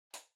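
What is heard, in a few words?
Ceiling Light (Far remote control Cover Close)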